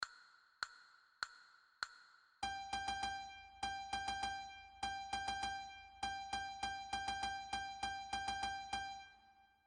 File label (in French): Sol, flute a bec